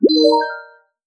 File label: menu hit; Katou Megumi by iEddie